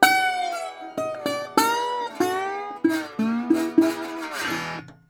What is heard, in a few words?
Blues Guitar Samples; ILIO - Fretworks